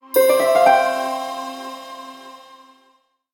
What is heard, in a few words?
Magic Short Harp Spell